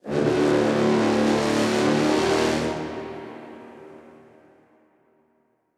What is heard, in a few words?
water drama; one dog story